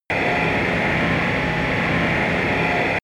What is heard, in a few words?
energy scream light; energie